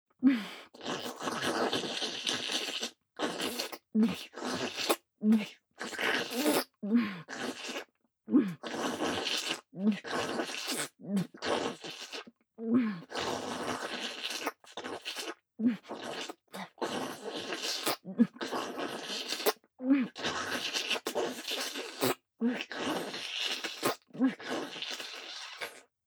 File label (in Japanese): バキュームフェラ・激しい, ASMR